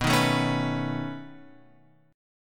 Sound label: B 9th B; B9 Chord {7 6 7 6 X 7} strummed